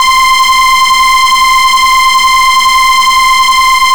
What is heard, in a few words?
C5 Norm, Solo-Synths; Mosquito; Giga Samples Collection